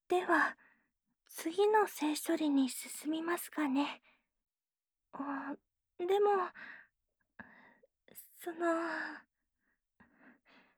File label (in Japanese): 耳を舐めてみよう（左）